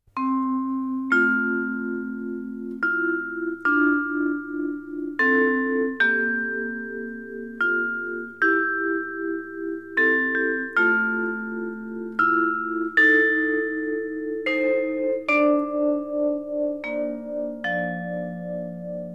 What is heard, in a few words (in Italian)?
CARILLON